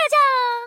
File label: jaja; furina